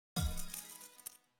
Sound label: casino win